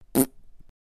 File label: quick fart